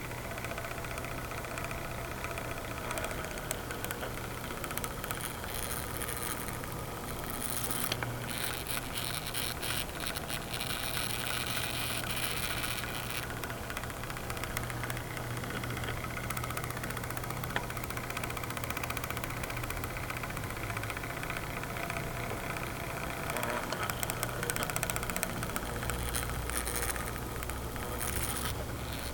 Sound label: noiz